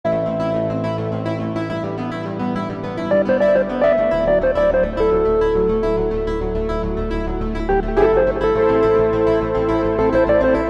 Rhodes version

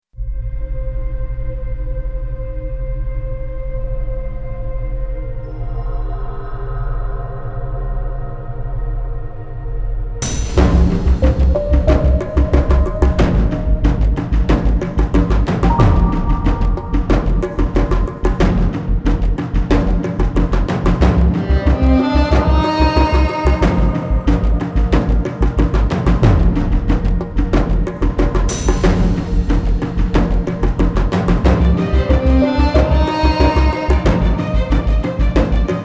Hybird Orchestral

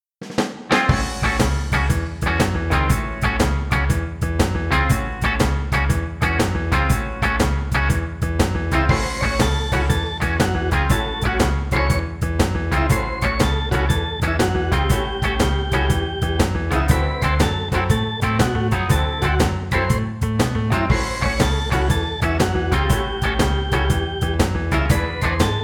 Shuffle

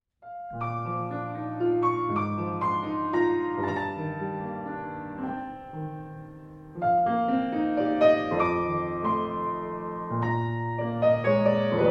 LULLABY

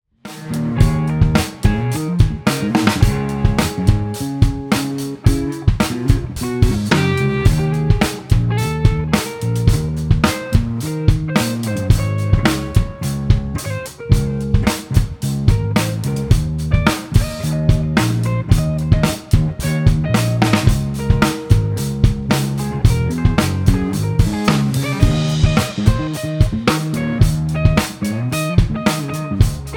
Funky Jam

